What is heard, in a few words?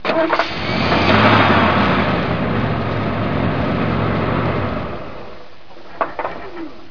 trucks